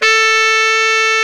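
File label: SAX Baritone Sax A 4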